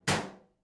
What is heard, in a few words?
Metal Footfall; soundscrate